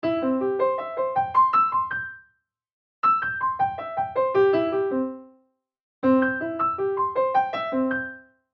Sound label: Ascending Descending Arp